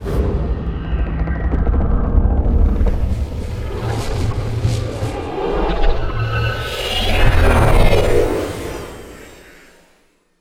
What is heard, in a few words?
Warp Sequence On Flash; Skybox